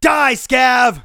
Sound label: fight; Escape from Tarkov; usec2